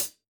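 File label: Closed Hat; drum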